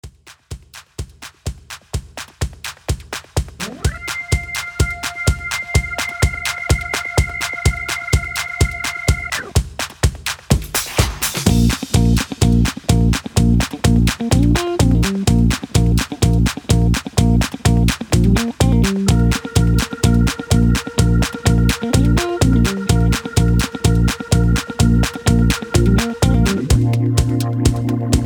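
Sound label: Instrumental No Melody No background vocals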